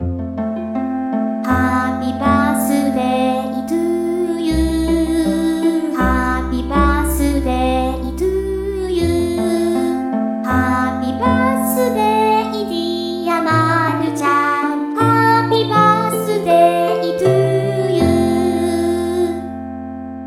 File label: happy birthday to you, vocal; 「お誕生日のうた」は、アメリカの保育園の先生だったミルドレット・ヒル とパティヒルの姉妹が作詞作曲した「ハッピバースディ